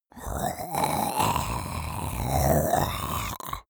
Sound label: monster choking zombie idle